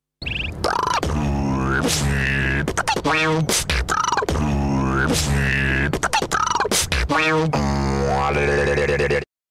bird beat boxing

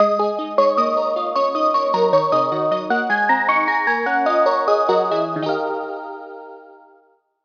Train Jingle

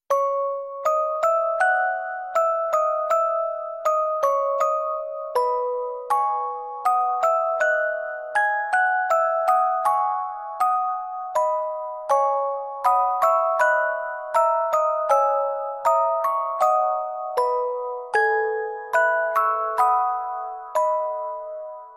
Music Box; SNK AOT

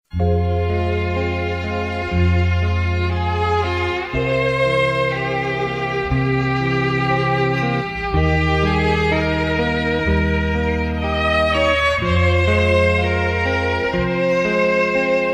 sad story

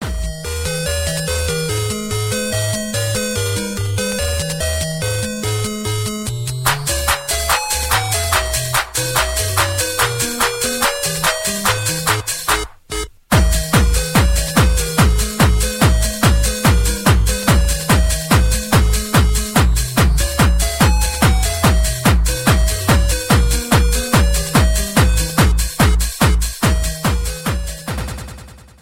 Tetris Ringtone

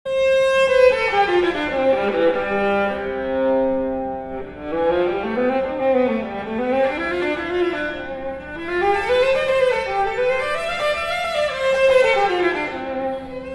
Violin

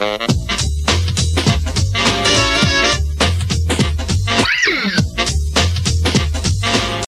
Jazzy Mix

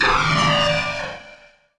Amp Fire

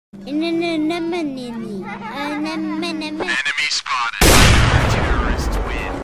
Drop

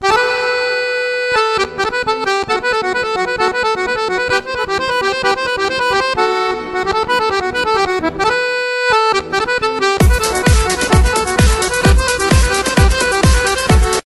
Harmonica sound

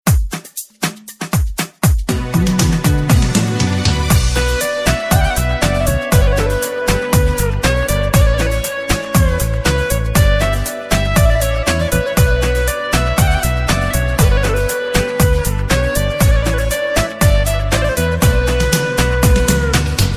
BBM Essential, ringtones

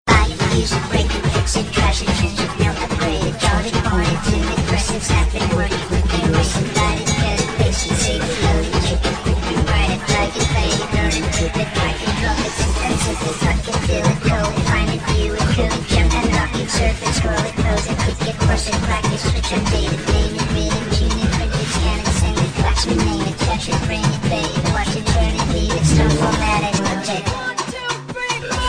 dance music

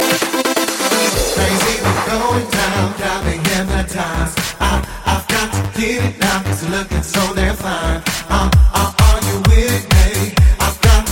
relax music

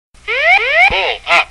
pull up, reggae

